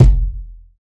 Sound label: subtle reverb steel kick drum sound f key